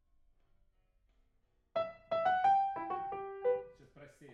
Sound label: PIANO